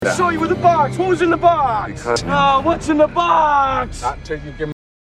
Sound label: box